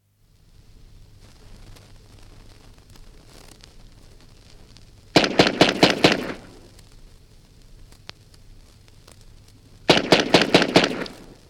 Two bursts of five shots of a Bren gun (from 78 disc); Machine Gun; BBC